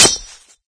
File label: knife hit wall; weapons; source engine; left4dead2